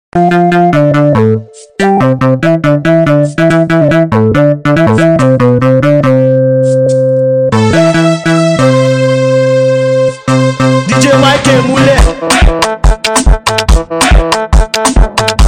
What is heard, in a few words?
Tiktok Beat